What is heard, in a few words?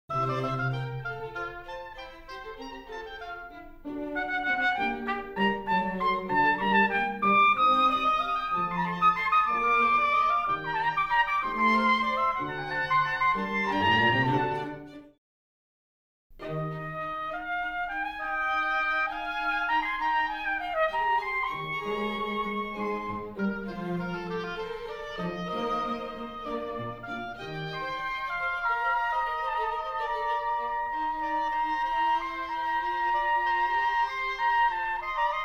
Concerto a 6 trumpet oboe and strings